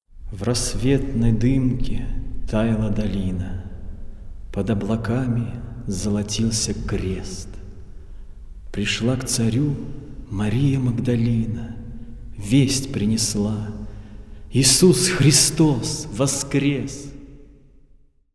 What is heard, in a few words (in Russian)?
Хор Валаамского монастыря